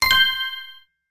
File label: QR Read Success; Arcade; Taiko no Tatsujin 2020 Version; Common Sound Effects